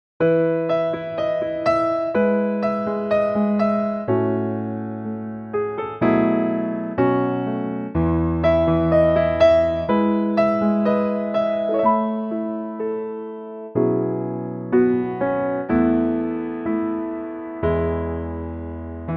piano E

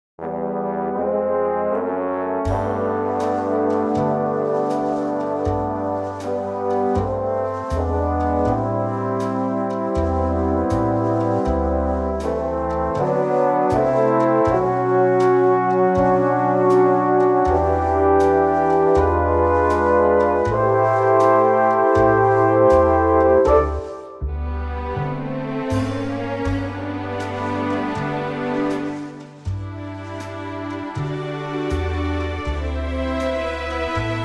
G orchestral; key - G - vocal range - B to F#

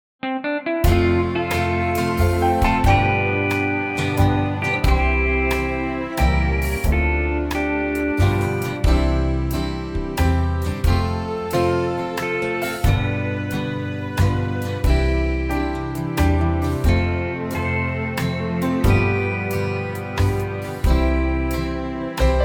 C waltz; key - C; vocal range - C to C; Lovely 3/4 country arrangement; brighter in tempo

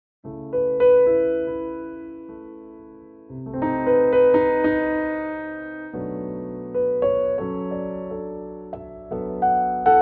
A piano; Pianotrak; key - A; A lovely 2016 piano only arrangement of this beautiful festive ballad, in a lower key.

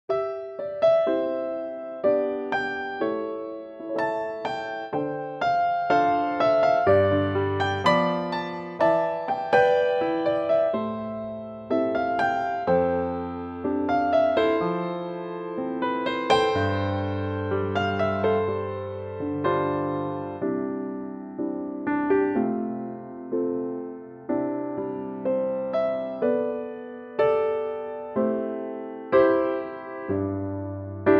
C piano; Pianotrak; key - C (Eb for the chorus); range - D to F; Very lovely piano only arrangement

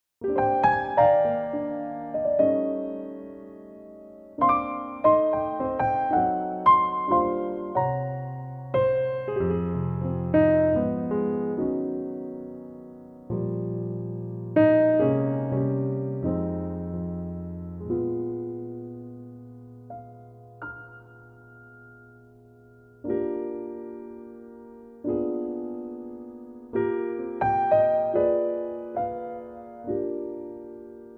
Eb piano trak; key - Eb - vocal range - Bb to D; sensitive piano only

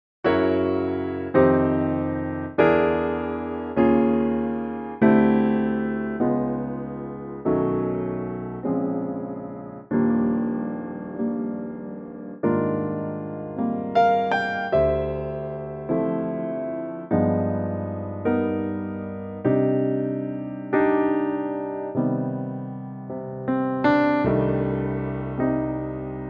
piano trak Eb; key - Eb - vocal range - C to D (optional Eb)

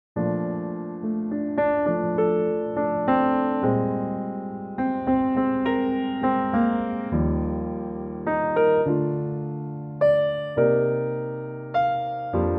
piano Bb

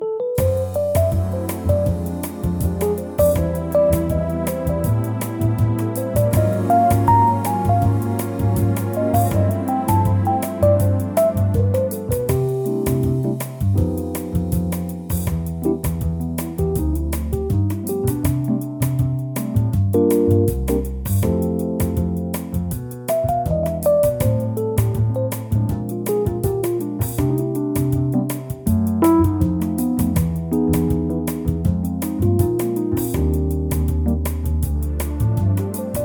C Bossa

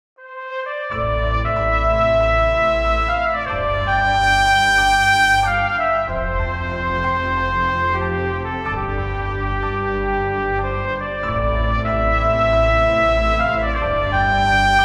C orchestral

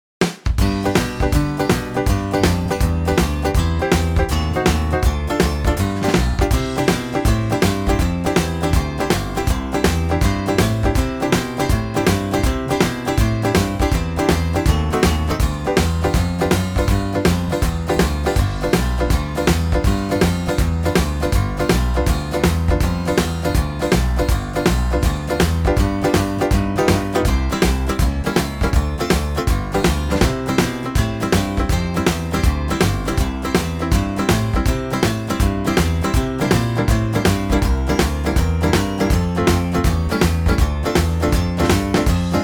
C No Accordion

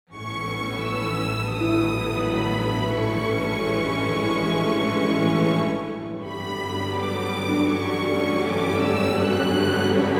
A orchestra; key - A - vocal range - F# to B; Stunning orchestra arrangement

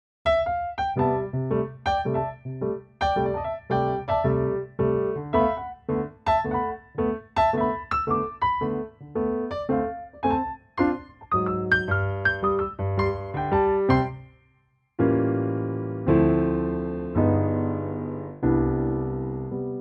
C piano

